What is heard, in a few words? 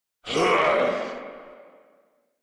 Death, Grineer Male